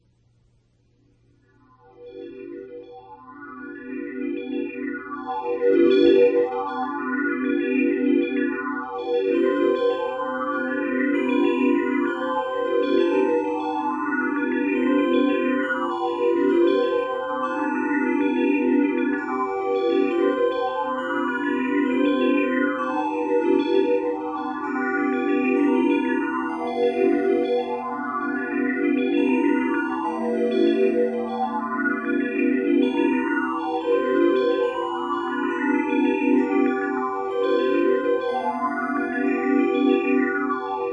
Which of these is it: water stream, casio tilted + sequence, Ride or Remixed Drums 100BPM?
casio tilted + sequence